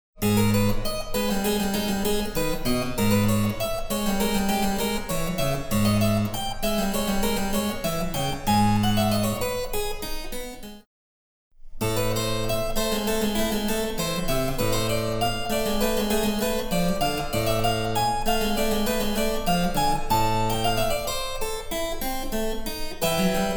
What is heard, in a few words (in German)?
Cembalo; Mark Lindley WTC 1